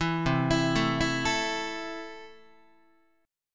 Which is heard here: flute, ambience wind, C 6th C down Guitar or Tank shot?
C 6th C down Guitar